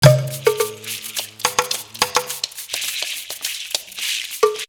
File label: Percussion; AMG - Coldcut's Kleptomania VOL-1